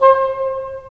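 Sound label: wind bassoon; LA Composer vol. 3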